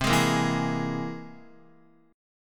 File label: C Augmented 7th, Guitar; strummed; JGuitar